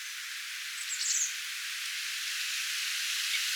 erikoinen rastaan ääni mikähän laji on kyseessä

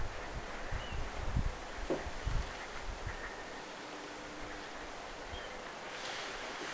sinisorsan kaksi soidinvihellysta

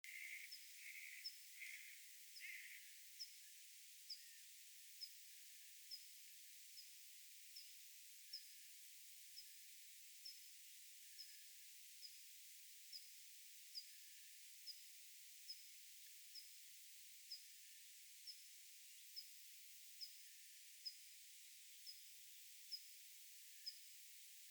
niittymetsakirvinen; sama paikka mutta noin200m edellisen paikasta

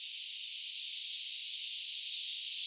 siina on myos sen ihmelinnun kaksi aanta pariaani

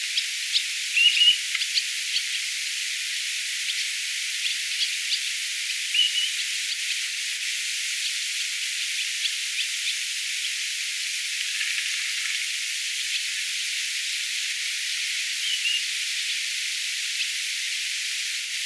rantakurvin ääntä

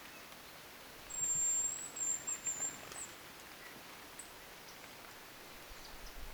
nuori pyy

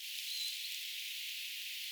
rakattirastaslinnun aani

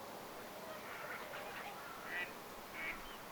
mika laji kuin jonkin itaisen kurpan laulua osa laulusta siis

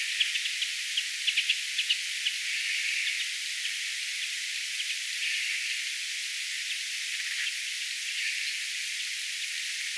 järripeippo nousee ilmaan äännellen; toinen järripeippo nousee ilmaan äännellen

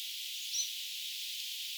tuollainen rakattirastaslinnun aani

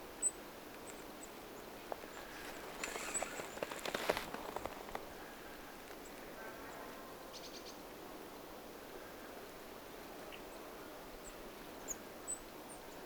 kuusitiaisen aania

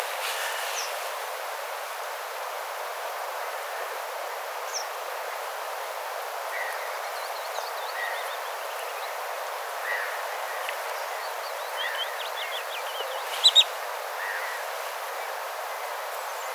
erikoiset ilm keltavastarakin aanet melkein kuin tavallinen vastarakki ehka ruokailuaania; erikoisia ääniä keltavästäräkiltä, melkein kuin tavallisen västäräkin ääniä jotkin

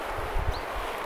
tämä on ilm sen kuvatun tiltalttilinnun sen jossa oli paljon keltaista yksittäinen ääni on

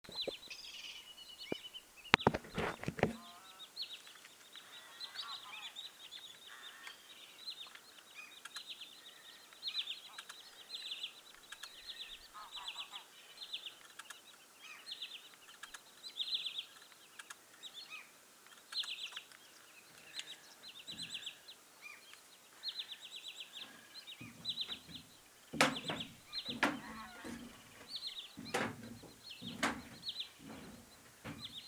lirolintujen aantelya

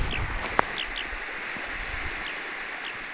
tuollaisia kiurun aania

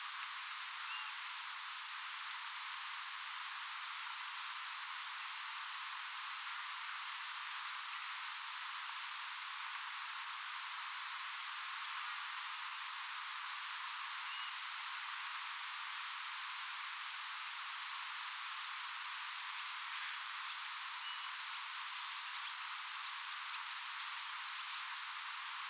kurmitsa joka aantelee piii

